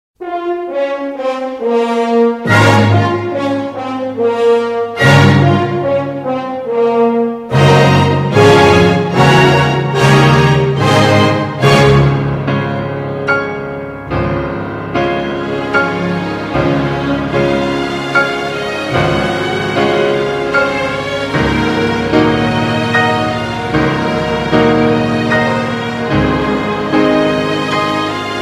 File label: concert piano